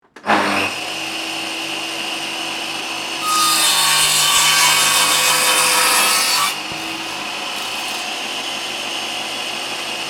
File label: carpenter, صدای نجاری